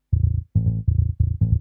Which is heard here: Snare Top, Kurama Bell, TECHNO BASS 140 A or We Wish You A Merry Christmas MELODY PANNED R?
TECHNO BASS 140 A